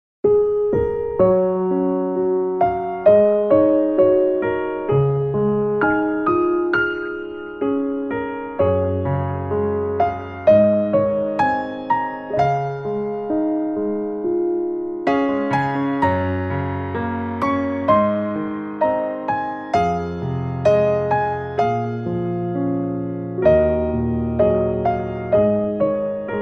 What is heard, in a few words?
rain piano; australian rain on remembrance day